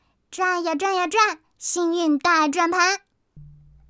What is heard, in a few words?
turntable